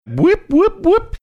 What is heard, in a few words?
imitating punching sound; punch out